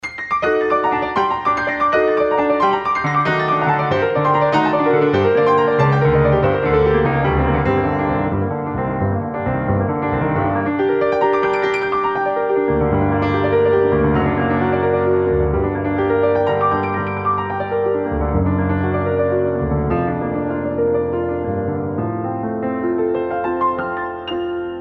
80's grand yamaha; propellerheads; reason pianos

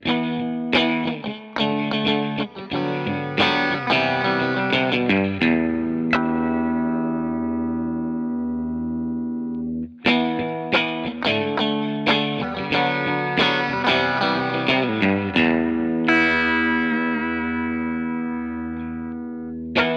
spring modes, reverb; tc electronic nova

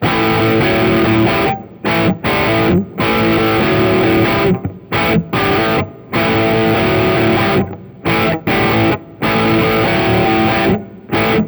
lead neck gibson; vintage

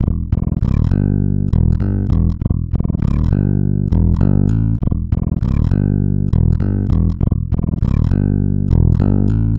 pitchshift bass; digidesign pro tools ts

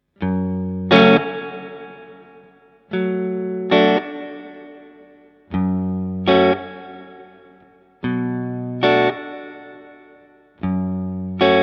reverb plate; bogner alchemist

